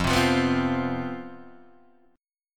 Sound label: C Major 7th Suspended 4th, F, guitar chord